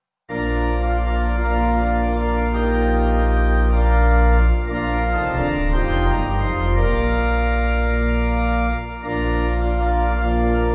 Pipe

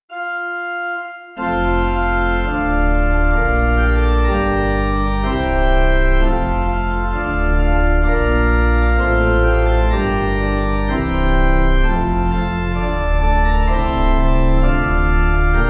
Pipe

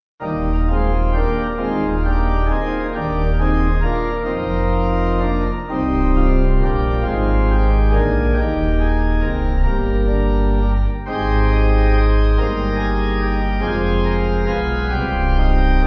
Who Is The Baby Aye Carol Pipe

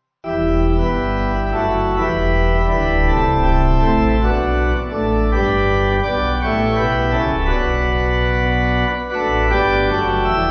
Pipe

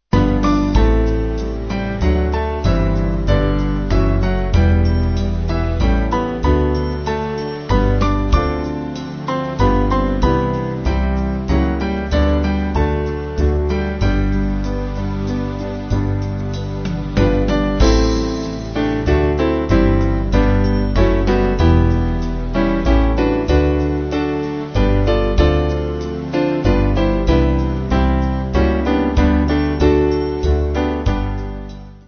Band

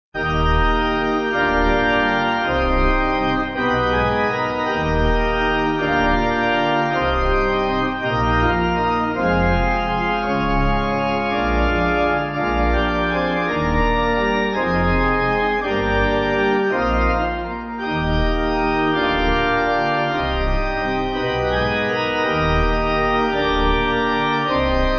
Pipe

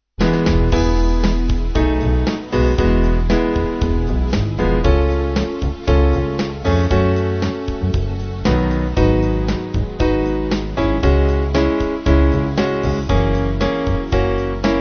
Band

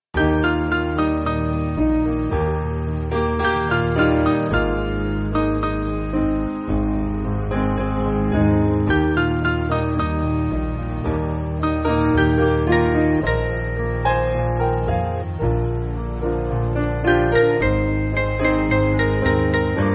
Piano

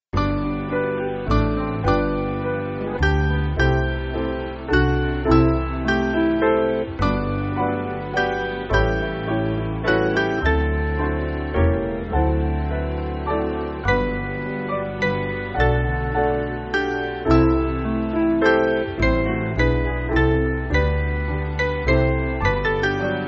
Piano

